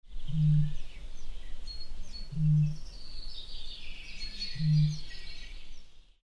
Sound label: bittern